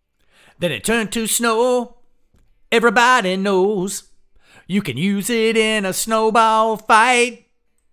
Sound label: vocals